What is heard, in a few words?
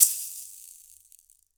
Shaker up; Digital Sound Factory